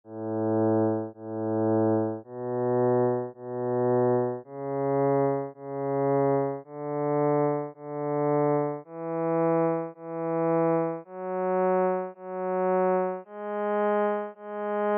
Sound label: cello direct